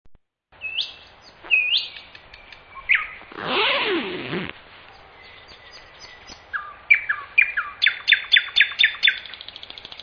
Zvuki Prirody Nochnoy solovey

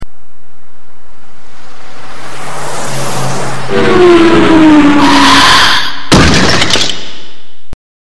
zvuk tormozov i udar

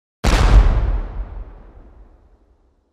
vystrel iz pushki

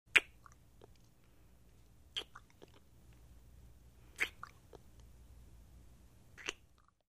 zvuk chmok pri pocelue

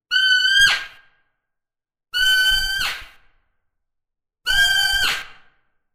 manka kosuli

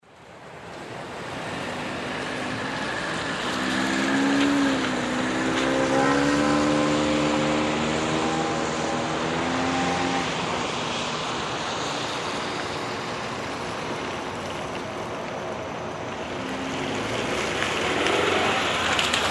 zvuk dorogi v gorode

